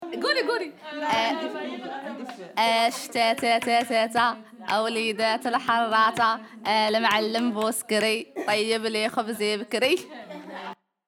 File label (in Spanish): Canción de lluvia